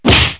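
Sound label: big smash, impacts; Wired for Sound Pro CD; Aristosoft, Inc.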